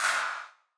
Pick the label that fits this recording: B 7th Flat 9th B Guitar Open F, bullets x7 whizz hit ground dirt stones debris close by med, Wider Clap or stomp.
Wider Clap